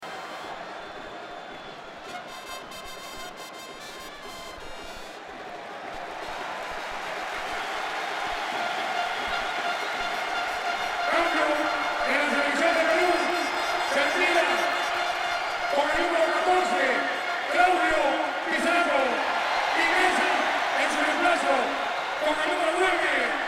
PARTIDO DE FÚTBOL PERÚ VS CHILE CAMBIO DE JUGADOR PERUANO SEGUNDO TIEMPO

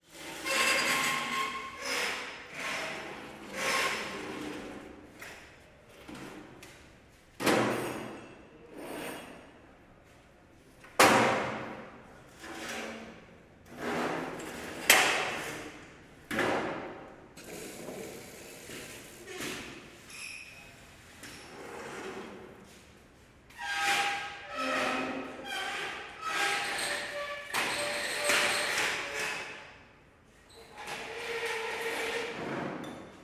MOVIMIENTO DE METALES Y OBJETOS EN HANGAR DE UTILERÍA

